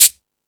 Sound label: Timbale, E-MU Pro-Cussion